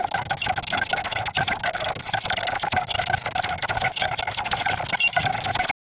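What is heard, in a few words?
hypnotic spiral glitching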